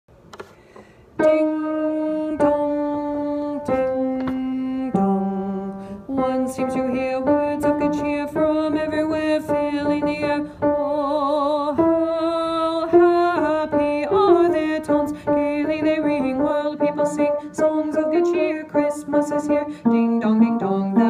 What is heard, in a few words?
carol of the bells tenor part